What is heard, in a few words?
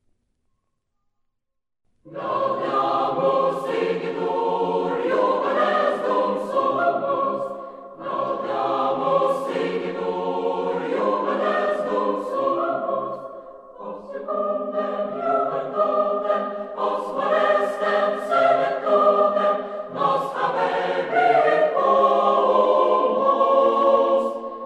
gaudeamus hymn students